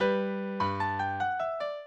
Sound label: minuet, mozart